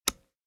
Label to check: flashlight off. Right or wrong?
Right